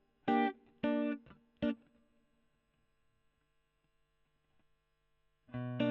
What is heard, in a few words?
E.GTR Gefell